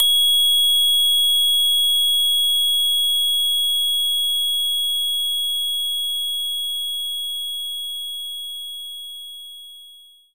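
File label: Glockenspiel G#6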